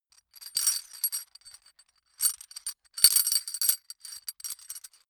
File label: metal chain rugged handling